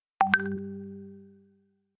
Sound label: notification message tone; tamil